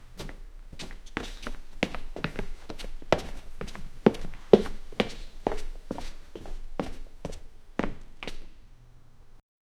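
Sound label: footstep walking over wood; haunted house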